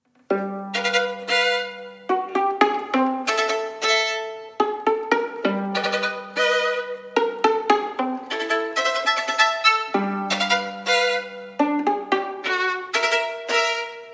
theme with 8 variations for solo violin variation iv allegretto